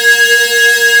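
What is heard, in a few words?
DISCO BELL A4; Analog Odyssey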